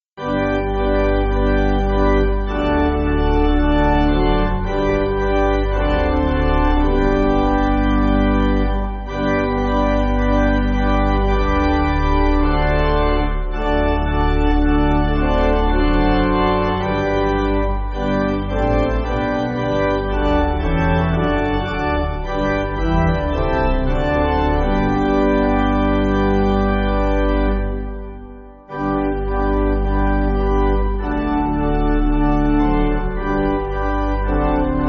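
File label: Pipe Organ, church backing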